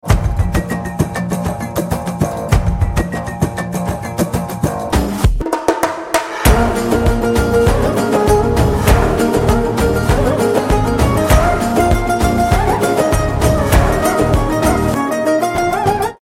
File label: Bgm